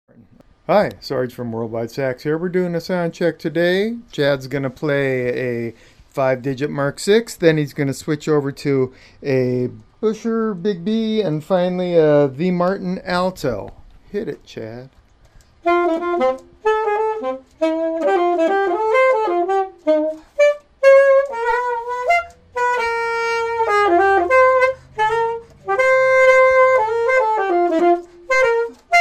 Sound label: selmer vs buescher, sax; TMA